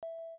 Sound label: Call Start; LG KH5800